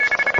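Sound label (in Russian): шум телефонного звонка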